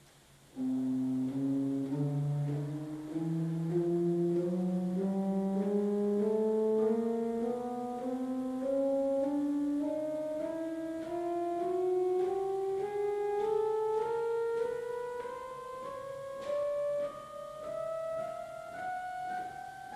Manuál Roh kamzičí 4', Ukázka zvuku, varhan

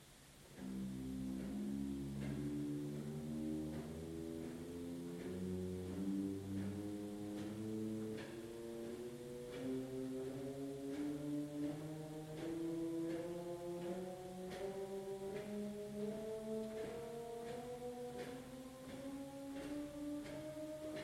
Manuál Voix celestis 8', Ukázka zvuku, varhan

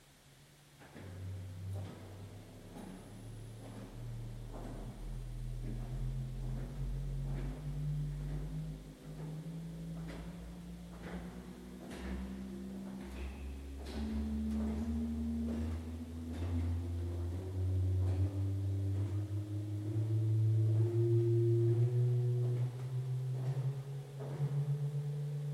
Pedál Subbas 16', Ukázka zvuku, varhan

